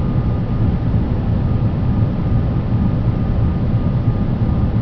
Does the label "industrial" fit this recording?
Yes